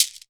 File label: SHAKER, Afro Shakers, Shake&Rattle; Roland LCDP14 Africa VOL-2